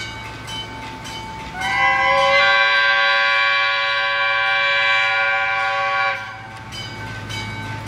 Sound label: Diesel, horns; trains